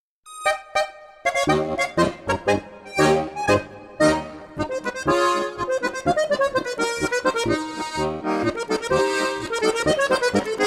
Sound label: Polka